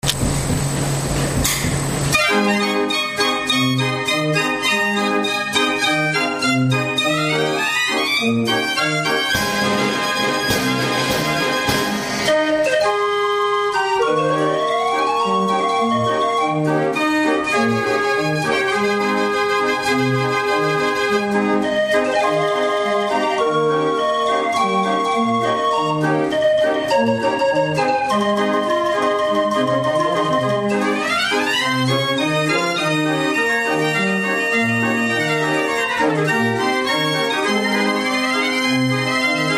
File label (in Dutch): draaiorgel; den teugelder